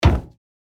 Footsteps on metal ship deck single step

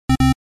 Arcade 8 bit death

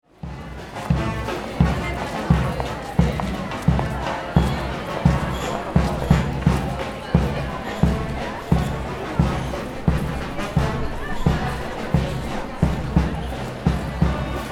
Celebration parade ambience Montreal CA; Urban, Town, and City

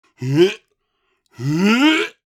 Aged man gagging before vomiting; Puke and Vomit; gfxsounds

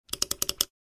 Apple magic mouse multiple click

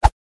Very fast swish

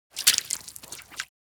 Casting the fishing rod into the water

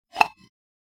Ceramic jewelry box open

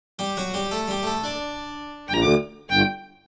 Mobile game spooky mystery level up

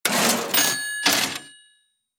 Cash register open ding; shopping

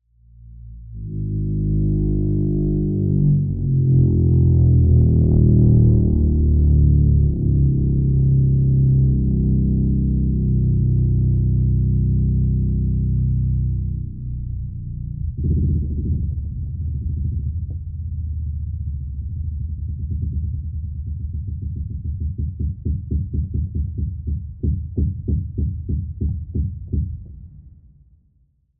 Cinematic wood textures vibrations

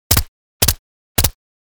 Thompson submachine gun two three round bursts; war

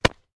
Catch leather baseball glove

